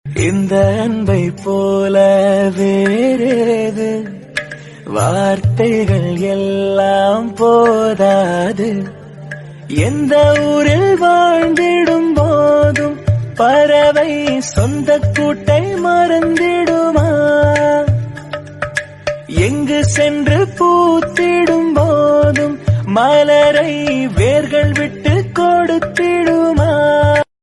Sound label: Tamil